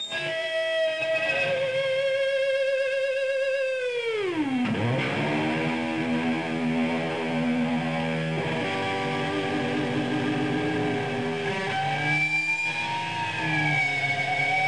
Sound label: GUITAR